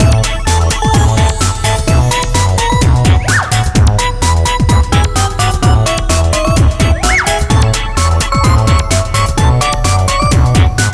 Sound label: snow